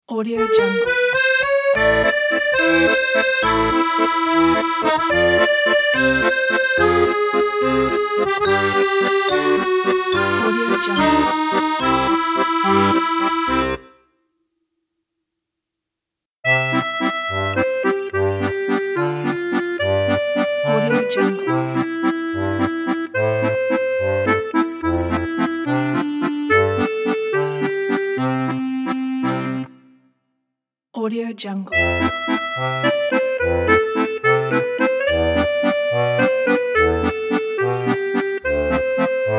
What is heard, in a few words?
accordion logo pack